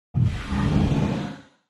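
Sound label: Space Door Open Slide, doors high tech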